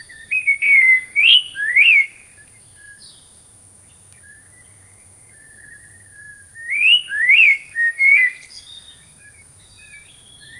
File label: tropical forest bird sounds